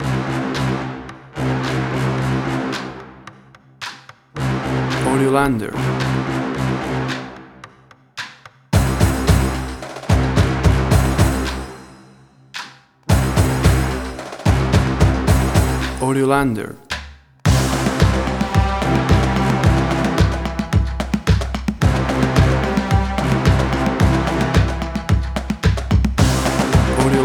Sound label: Drumcore